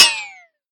Ricochet

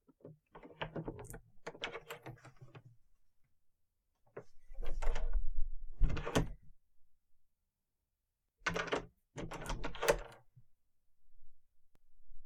Opening Closing Locking And Unlocking A Room door

